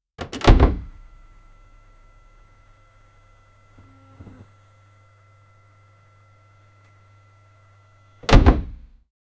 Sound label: peugeot 205 door open, car